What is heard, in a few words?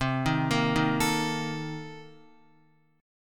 C Minor 13th C Guitar; Cm13 arpeggiated; JGuitar